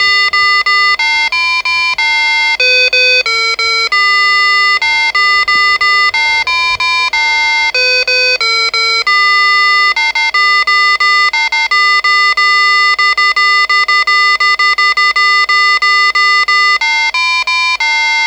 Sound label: old mac donald; long; maximus